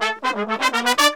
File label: 120, BRASS SAMBA; Best Service ProSamples vol.14; AKAI